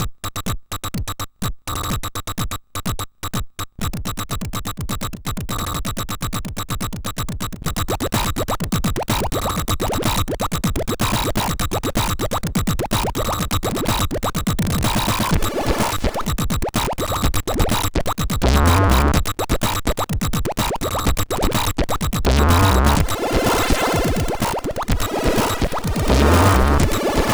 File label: Acid Dance, CSG8580R5